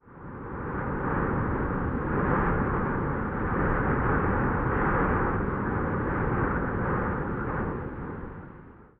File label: ambient battle; World Of Warships